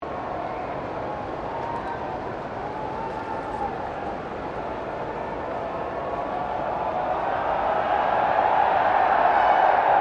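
crowd 20K Melbourne Cricket Ground Mexican wave, Cricket at Melbourne Cricket Ground; Crowds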